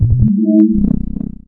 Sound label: dog phrase; source engine; episodic